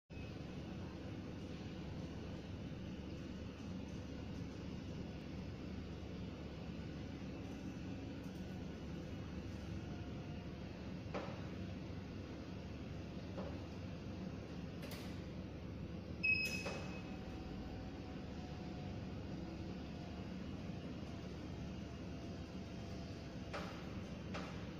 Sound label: Electric medical chair